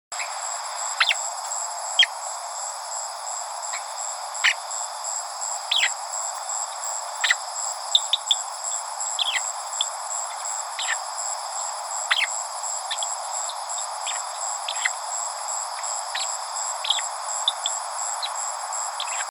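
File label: hylarana erythraea, Common Greenback call; Kent Ridge Park, Singapore, 11 May 2020, 2000 hr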